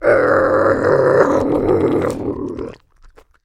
Bobcat Snarl, Menacing. Vocal With Lip Smack. Close Perspective.